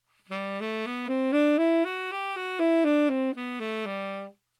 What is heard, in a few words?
G major scale, saxophone